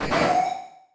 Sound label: Sci-Fi Electronic Airlock Warning Light; Science Fiction